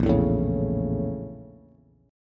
A sharp Minor Major 7th Flat 5th A sharp Bass; A#mM7b5 strummed; JGuitar

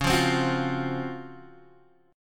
C sharp Major 11th Guitar DADGAD

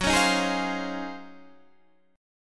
Eb Minor 13th Gb

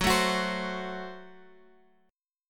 Gb Diminished Guitar Open G

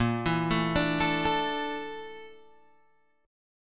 A sharp Major 7th Flat 5th, Guitar Open G

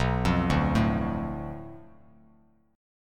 B Minor 7th Flat 5th B Bass Standard, chord; Bm7b5 arpeggiated; 7,8,0,2; JGuitar

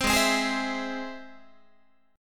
Ab Augmented Ab Guitar; Ab+ strummed; JGuitar

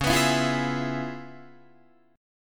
Db 7th Sharp 9th Db down Guitar Open D

